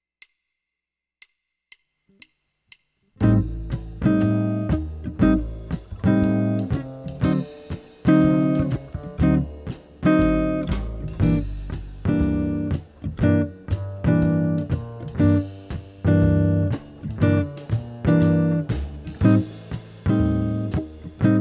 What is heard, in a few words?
jazz chords